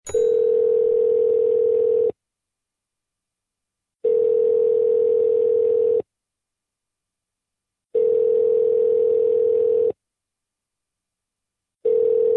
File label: dial ringing tone; lucius ii the prophecy